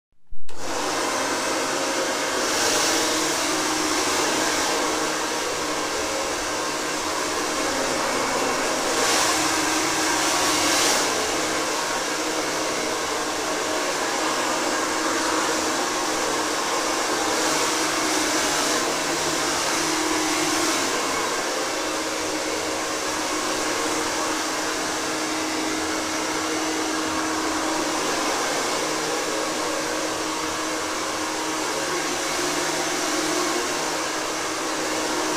HABITAR secador lavabo

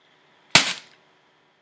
plastico golpe

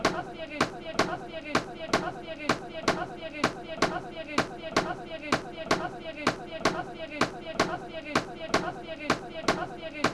ritmo

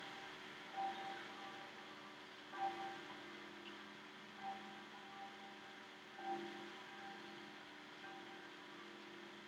casa campanas

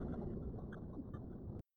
casa sintesis lluvia

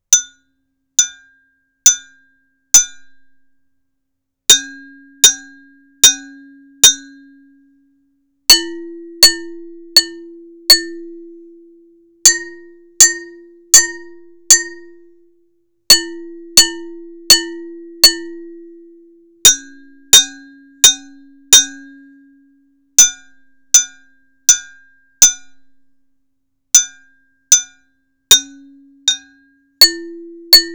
vidriofono

